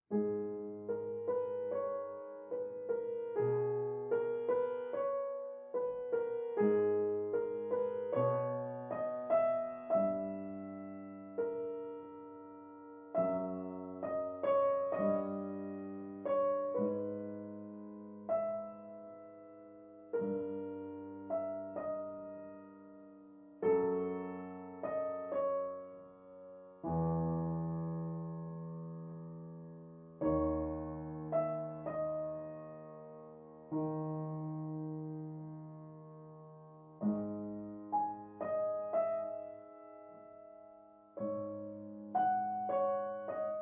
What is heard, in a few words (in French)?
chanson triste